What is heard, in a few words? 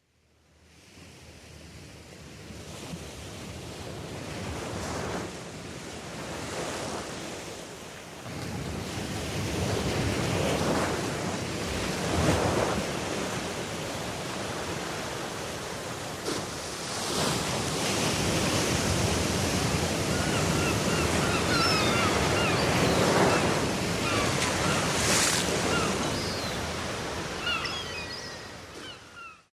Sound Effects